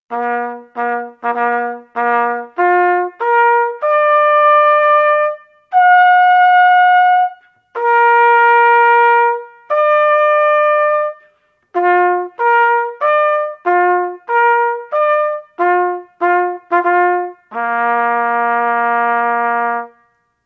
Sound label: Church Call, bugle